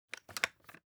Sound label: movement sniper; gmod; arccw uc commons attachments